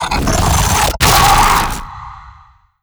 vox run warning, zombie revived

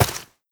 step, footsteps, player, mud

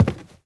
step, footsteps cardboard

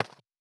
foley general grab; ui; animations

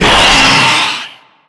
death, antlion; creatures; vox

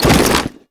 foley step, soldier; combine; soldier charger

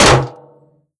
vent step, headcrab reviver, creatures

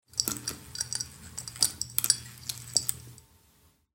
light flicker, ambient lights

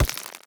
step layer, headcrab reviver, steps, creatures

